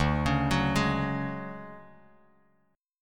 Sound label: D Major 7th Flat 5th D down Bass Standard, chord; arpeggiated; JGuitar